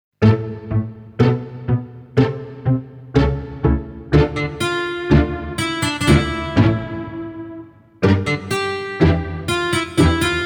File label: Pirouette, Ballet